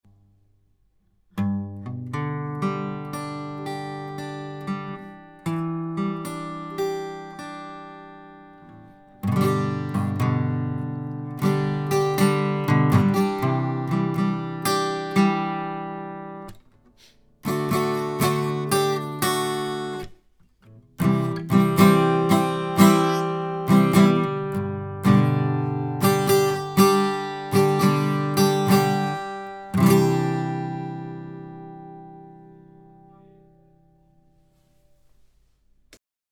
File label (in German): Gitarre; UA Block167 Lewitt LCT640 TS